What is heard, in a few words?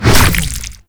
Infested Ancient Tentacle Swing Hit; Warframe